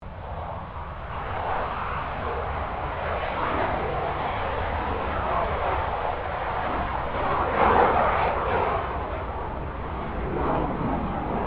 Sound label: AIRCRAFT CA-27 SABRE TAKEOFF; CA-27/F-86 Sabre; Airplanes; Vehicles